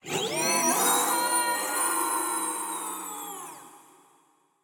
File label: falling off a cliff; 堡垒（守望先锋）